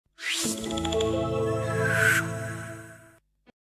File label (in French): jingle; rtl9; pub debut